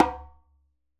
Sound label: djembe Single Hit Right Hand; Raw Perc One Shots; Slate; SD SEISMIC Drum Sample Pack